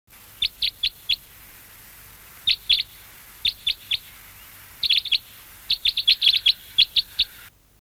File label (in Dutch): kruisbek, trekroepjes